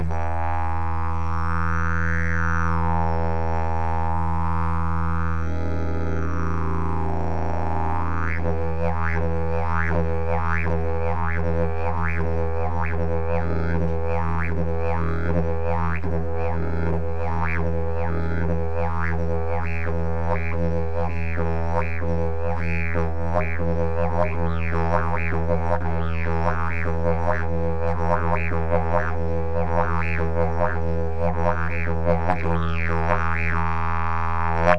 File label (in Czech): jilm podzimni, didgeridoo